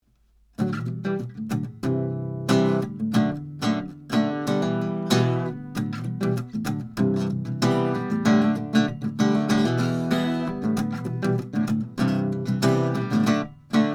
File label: Harmonic; 1933 GIBSON L10 ARCHTOP; ElectroVoice CS15e; Cardioid Pattern; Electret Condenser